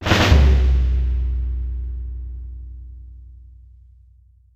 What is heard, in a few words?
drums